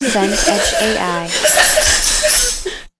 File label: laugh group